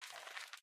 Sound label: recycle, windows 7; operating systems